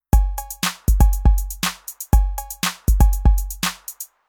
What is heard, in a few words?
Rhythm Electro